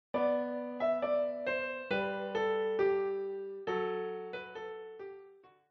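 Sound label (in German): Brüderchen komm tanz mit mir Klavier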